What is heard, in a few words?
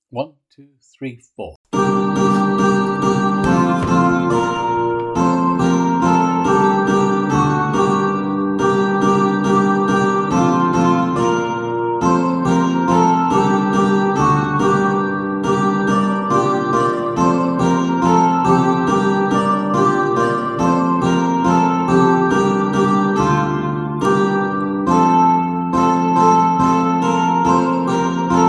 Bransle, playalong